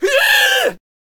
Squire Attack Vocals

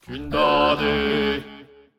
Attack Vocals; Pharaoh

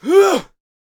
Pike Attack Vocals

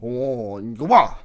Monk Attack Vocals

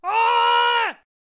Attack Vocals; Da Vinci

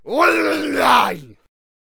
attack vocals; Berserker

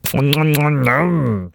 Vampire Attack Vocals

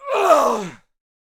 Pike Death Vocals

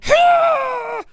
Painter Death Vocals

